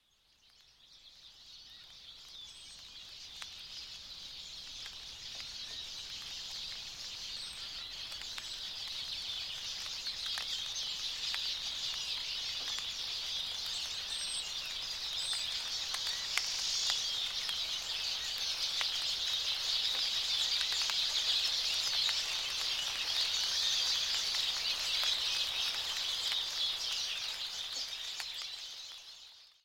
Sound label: goldfinches